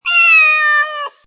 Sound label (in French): Cri; MOBICLIC 65